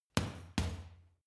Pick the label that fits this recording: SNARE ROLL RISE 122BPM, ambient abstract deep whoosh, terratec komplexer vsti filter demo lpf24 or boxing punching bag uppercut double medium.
boxing punching bag uppercut double medium